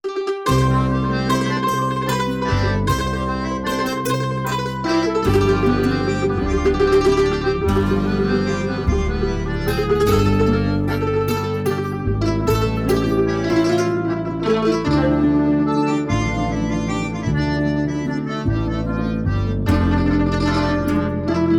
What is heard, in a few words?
ACCORDION SONG; production scores